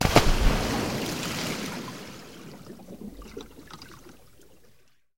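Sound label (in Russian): звуки бочки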